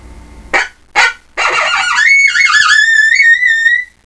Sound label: coyote